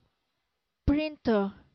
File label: printer